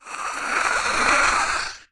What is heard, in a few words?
Monster breathes and hisses slowly. Alien, Breathe Growls, Monster Eerie Sound; breathing